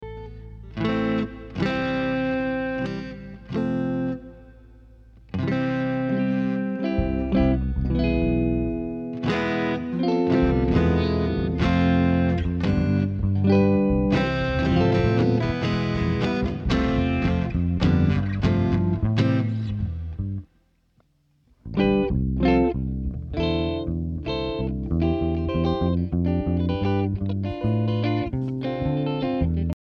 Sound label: Strings